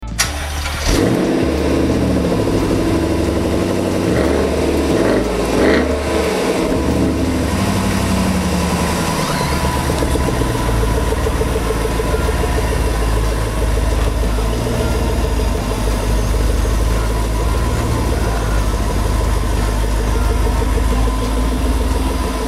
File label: Jaguar XKR